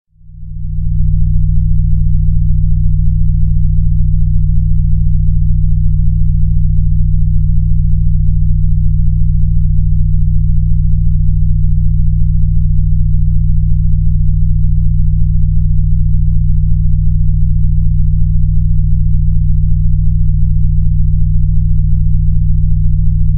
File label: Brainwave Enhancer Alpha